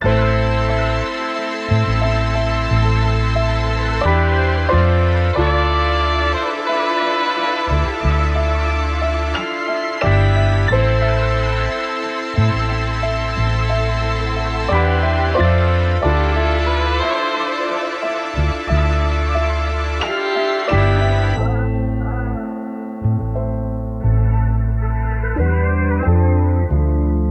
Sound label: 90 BPM A Min; Cymatics; Zodiac III Beta Pack; Zodiac III Production Suite